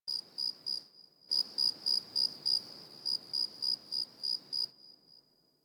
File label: Insect Night; Ambience; One Offs; Warframe; Eidolon Plains